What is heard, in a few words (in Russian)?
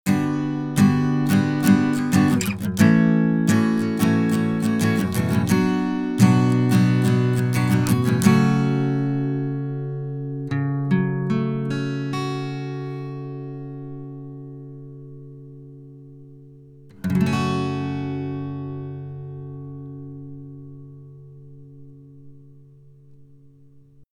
uad volt 2 gitara; UAD Volt 2 - Гитара; аудиоинтерфейс; Universal Audio (UAD) Volt 2 это бюджетный аудиоинтерфейс за 260$